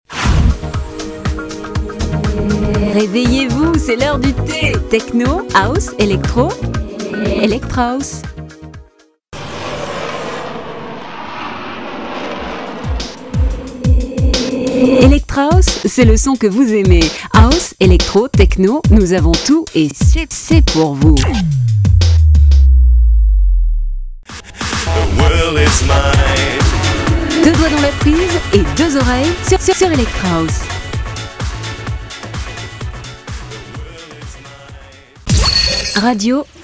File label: Radio ElectrHouse